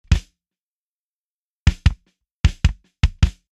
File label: kick